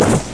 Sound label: activate; predator